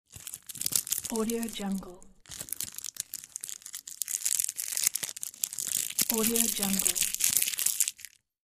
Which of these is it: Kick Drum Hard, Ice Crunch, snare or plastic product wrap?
plastic product wrap